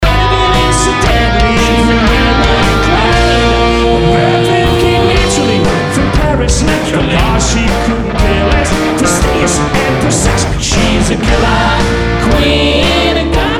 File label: Chorus, Live